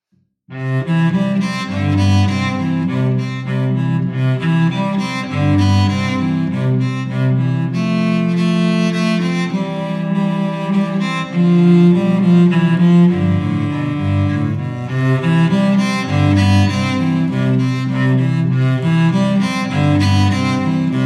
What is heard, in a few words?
with bass bar, violins